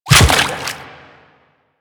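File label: step on water, footsteps; Warframe; The New War; Kahl; Quests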